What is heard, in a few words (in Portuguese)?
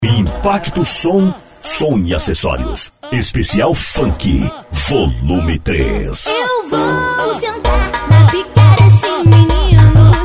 funk bass; impacto som